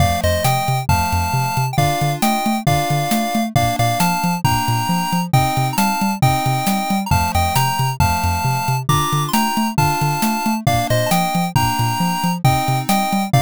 bgm street

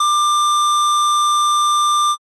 ecg flat

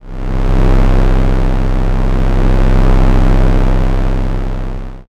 space engine

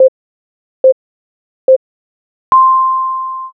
jiho

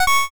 join room

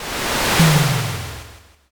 fire magic

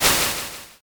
swoosh

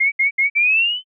violin fear

